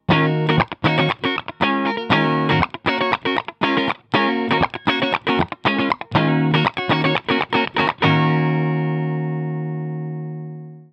Tele Neck; AVID Fasttrack; Fasttrack Duo; e606

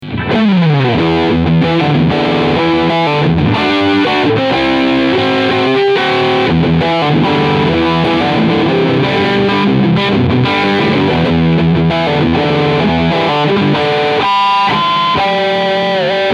Riff

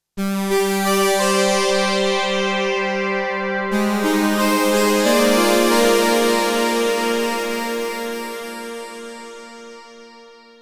Marion Systems MSR2 Polysaw

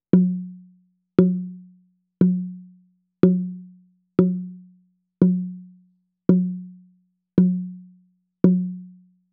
Warm Audio W47F Bongo e865

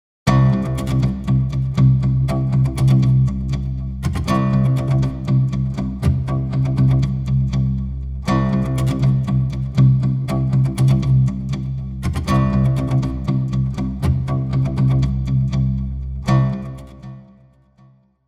Acoustic Guitars Rev; Scoring; Performance Palettes

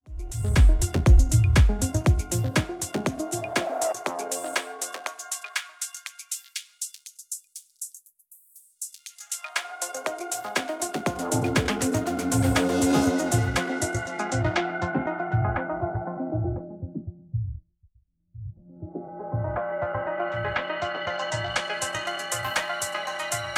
Bipolar null reso

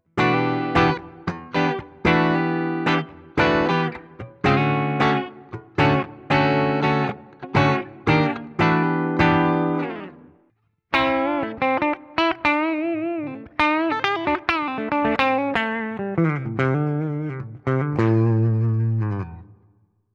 Cort X700 Both Pickups Clean

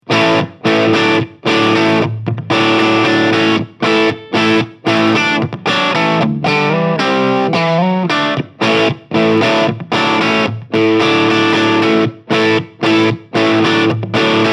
Marshall 1992 Both Channel Crunch

